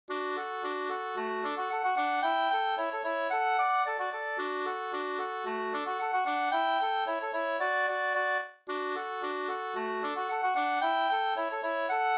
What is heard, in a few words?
polka; Lundins polka spelad av Flens spelmanslag, Södermanland